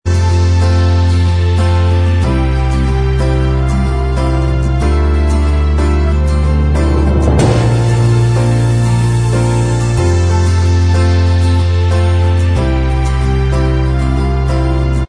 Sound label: Inst Track